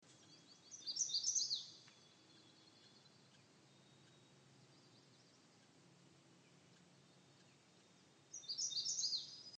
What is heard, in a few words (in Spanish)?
Reinita de Magnolia Setophaga magnolia